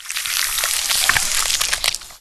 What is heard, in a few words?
web ground con hissing; mactans